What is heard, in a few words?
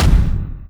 Standard Cannon Shoot